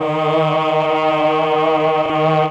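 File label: cho d sharp 2, Choir, MELLOTRON; Retro Sampler; Choir + Flute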